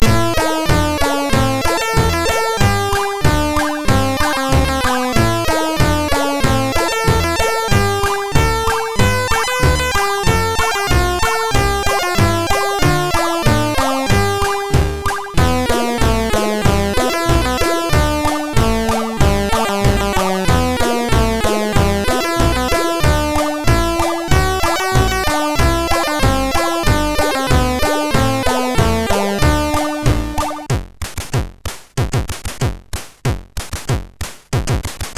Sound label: sid MOS6581R2; Fiendish Freddys Big Top O Fun